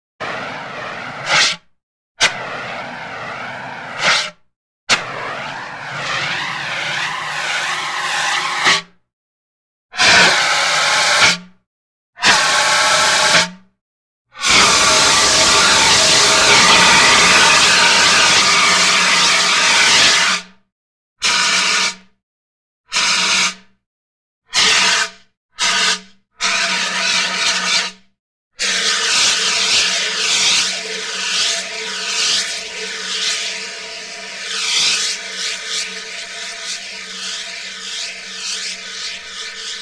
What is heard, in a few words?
Fire extinguisher